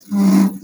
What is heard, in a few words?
harsh slide